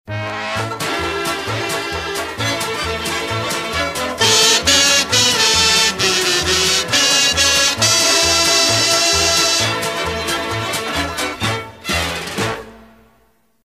Kazoo oo oo; PAMS; WAIR

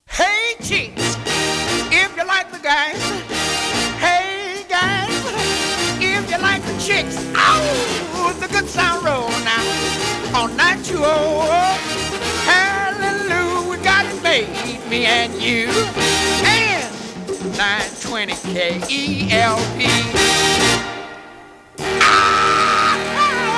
Oh Yeah; KELP; PAMS

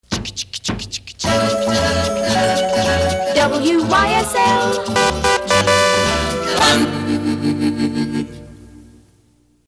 Choo Choo Boom; WYSL; PAMS

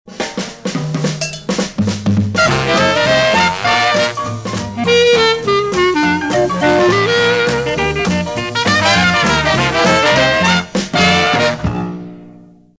Dixieland; WNOR; PAMS

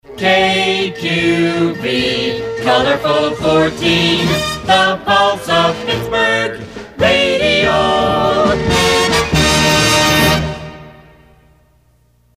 Marine Weatherwatch; KQV

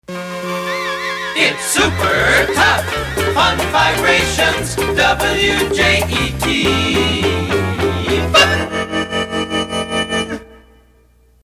Invasion Vibrations; WJET; PAMS

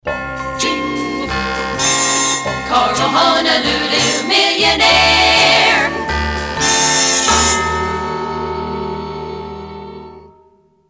Strikes Gold; KORL; PAMS

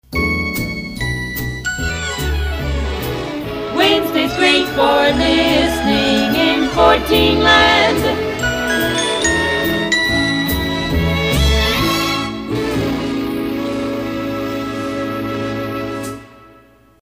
Weathermation Wednesday; KQV; PAMS

